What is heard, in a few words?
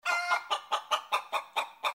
chicken, Farm